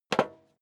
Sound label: step leather shoes metal dry single stair down